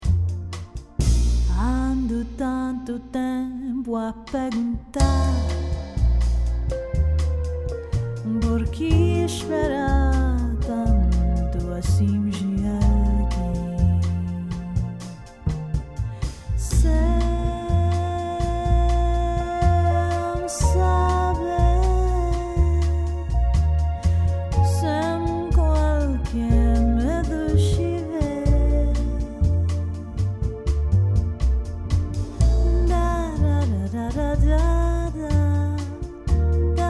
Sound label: Latin Singer; Los Angeles; Los Angeles Latin Singer and her world-class backing musicians